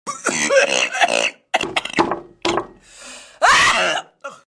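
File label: choking on noodles; audio meme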